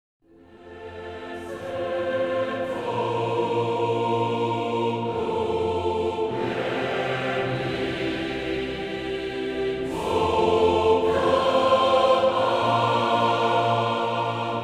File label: Church Choir